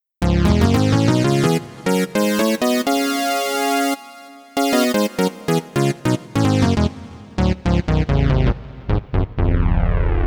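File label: lead detuned; the transmitter soundset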